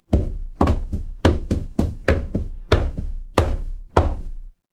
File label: footsteps stairs